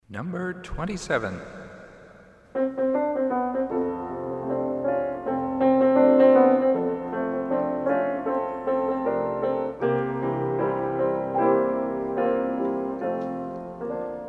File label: Hymn